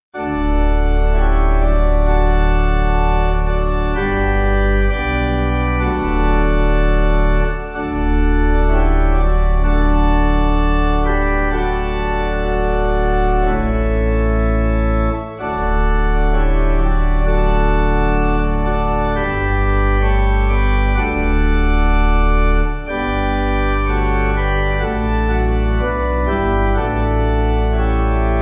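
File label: Pipe Organ, Music Backing Files for Churches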